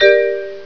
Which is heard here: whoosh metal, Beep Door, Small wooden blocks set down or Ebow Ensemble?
Beep Door